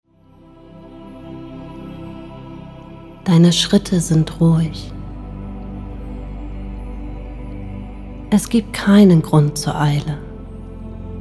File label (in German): Malerische finnische Winternacht; Europa